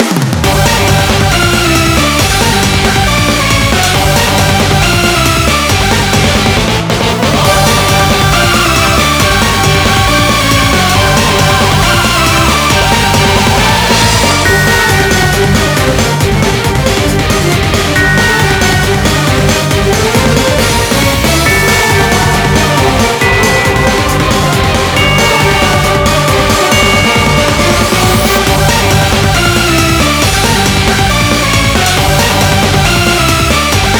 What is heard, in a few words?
Cannon Battle BGM; pachislot eureka seven ao